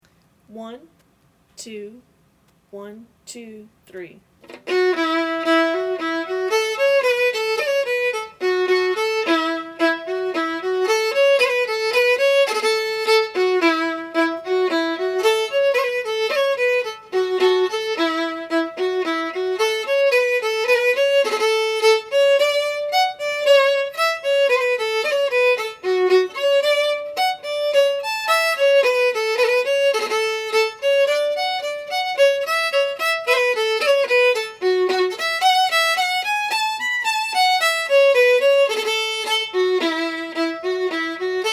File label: Fiddle; Miss Wedderburn